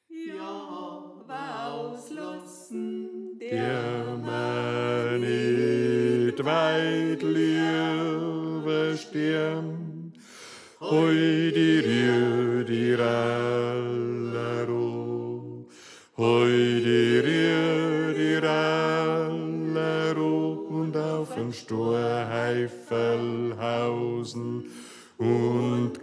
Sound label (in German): Bass